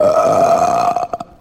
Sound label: burp medium